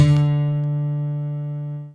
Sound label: lyra; theodyssey